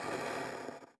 Hard Slide Slow

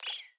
Single UI Beep

